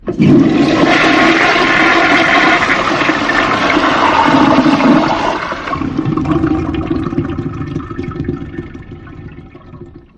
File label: Flushing Toilet